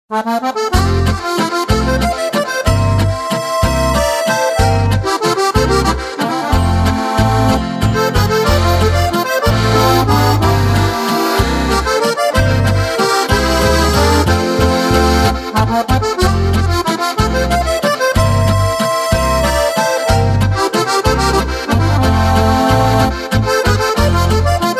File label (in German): Akkordeon